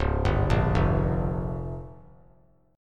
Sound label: Db 6th Flat 5th Db down; Db6b5 arpeggiated; JGuitar